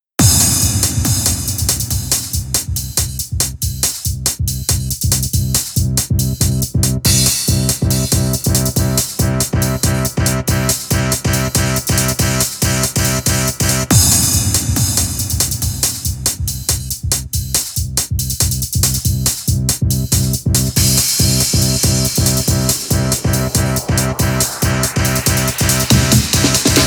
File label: dubstep remix